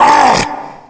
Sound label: tank pain